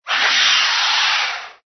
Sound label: ANIMAL CREATURE